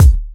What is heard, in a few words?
Kick